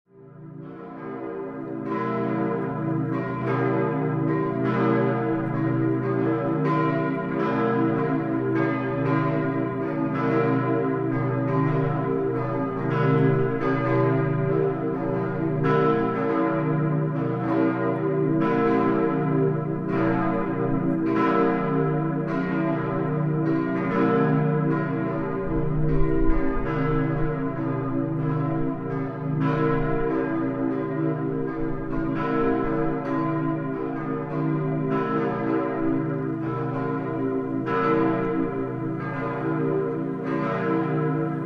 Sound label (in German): church bells long; heiliggeistkirche